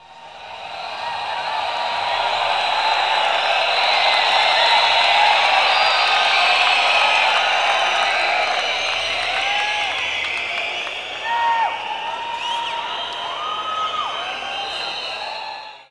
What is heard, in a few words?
APPL, XP APPLAUSE